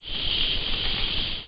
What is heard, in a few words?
gas; ringtone; Mini Militia